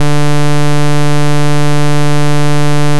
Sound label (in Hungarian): fureszfogjel